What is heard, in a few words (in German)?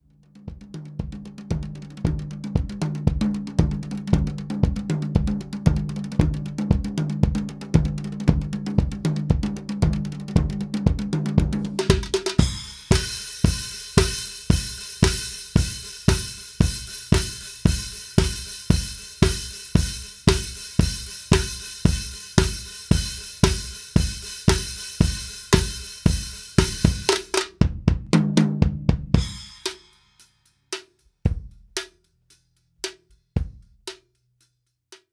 Schlagzeug Ausschnitt